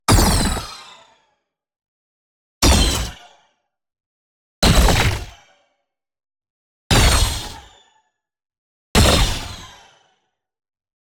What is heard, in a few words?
neon hit small proton hit; boom library